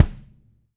BD, KIT Real Kit; 90 s Sample CDs; Sound & Vision - Gigapack I CD 1 (Roland); Real-Kit m16

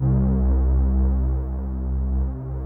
OCTENTE C2, YAMAHA, Analog Fantasies; USB Soundscan vol.49; AKAI

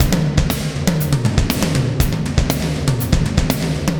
120 POWER, drums

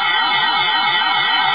ants; Amiga Soundtracker Sample Packs

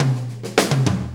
4-4 Fill, Drum Loops

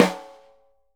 SNR, Synclavier Percussion 2 - World & Orchestral

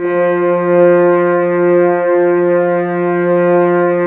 Brass F.Hrns F; Miroslav Vitous - Woodwind Brass Ensemble

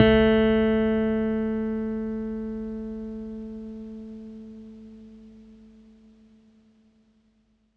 Bass A, Stick; Giga Samples Collection; BassSide

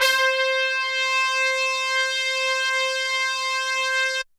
HORNS C4 R

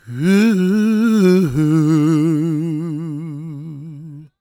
GOSP MALE, SOLO; Vocal Planet Vol1 Gospel

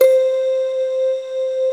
SYN GLASS; Sound & Vision - Gigapack I CD 2

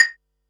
Perc, Percussion and FX; Pearl SC-40

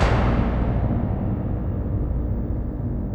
A#3 TYMPA; Northstar; The Wizard VOL-1

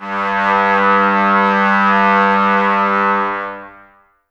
BRS TBSG, Brass, Tbs Mute; Miroslav Vitous - Woodwind Brass Ensemble

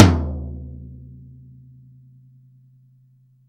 TOM D, Drum Dry; East Collexion; Drum 1

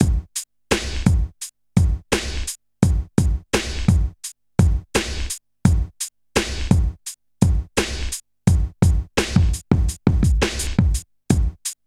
HIPHOP, Funky Xtreams; INIS

